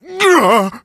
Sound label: hurt vo